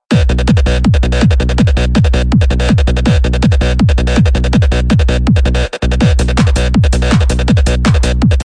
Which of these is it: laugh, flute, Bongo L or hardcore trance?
hardcore trance